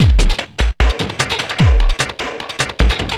Beat, Industrial

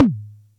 Percussion Computer Tom

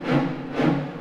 Orchestral Loops; 128 PSYCHO

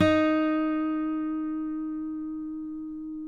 D#, guitars; Spectrasonics; Hans Zimmer Guitars VOL-2; FNGSTYLE PFM

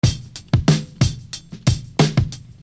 Beat, Hip-Hop Street Beats

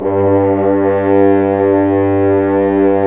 BRS FHRNF, F.Horns, FHns Dry f; Roland LCDP06 Brass Sections; F.Horns 2 f

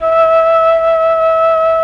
FLUT; ILIO; Propeller Island Legendary M400

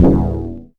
C#, BELL GONG, Synclav FM; Big Fish Audio

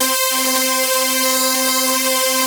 basses; Wizoo - Powered Wave

